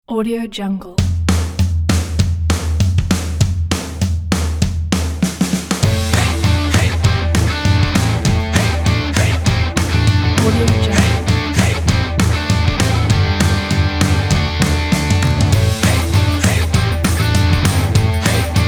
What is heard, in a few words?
happy rock